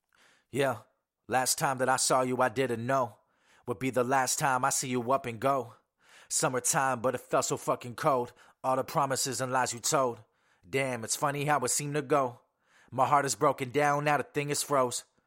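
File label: hip hop, 53, C major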